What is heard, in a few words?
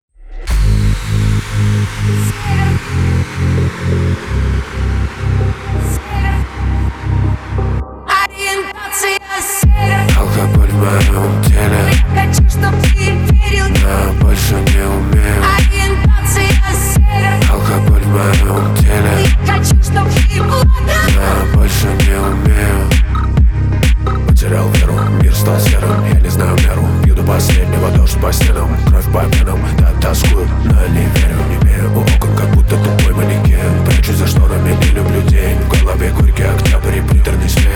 hardstyle remix